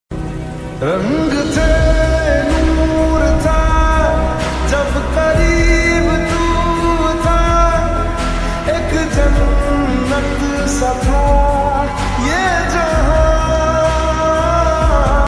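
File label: Slowed Reverb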